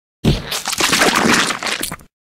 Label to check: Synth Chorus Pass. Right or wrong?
Wrong